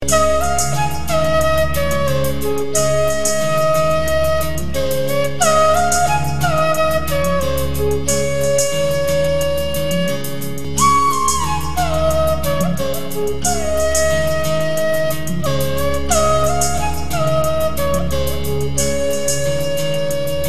chillout flute

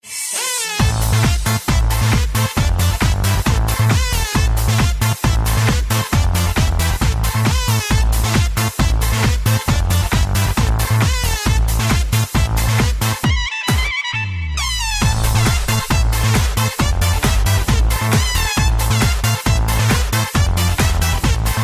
electro dance

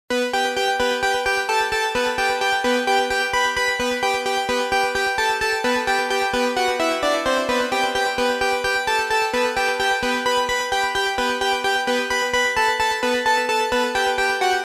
trap intro

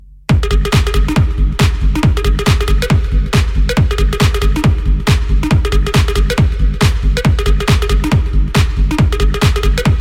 nice drums

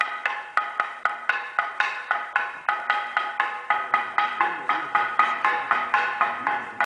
wood bell; hilandar

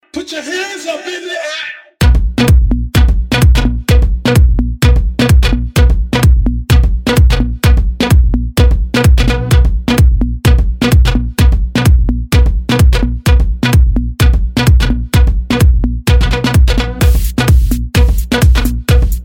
dj vibes hard bass

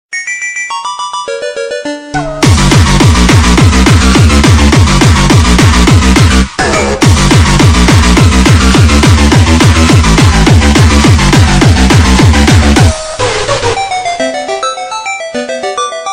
techno rmx; paper mario

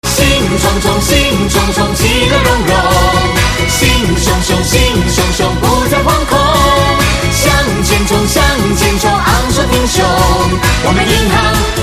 chinese new year

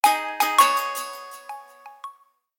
notification tone

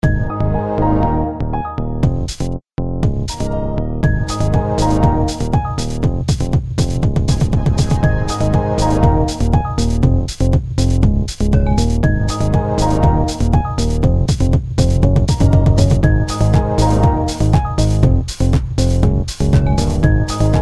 windows 7 remix

